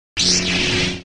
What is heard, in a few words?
Saber Hit